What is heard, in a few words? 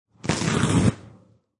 Toilet Paper Rip, Ripping toilet paper.; Bathroom; Household